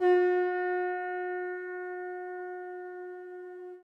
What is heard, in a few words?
flute guitar; interpolator